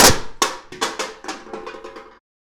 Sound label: Drop Plate; FREE SFX PACK VOL 3